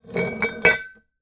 wine bottles x3 slide on table bang together; foley

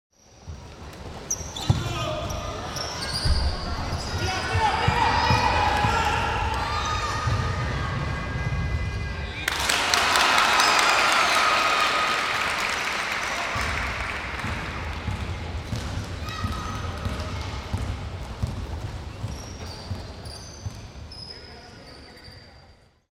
Attack, Dribbles, Light Applause, Tense; Sound Ex Machina

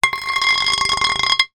foley small metal pipe scrape concrete; zapsplat

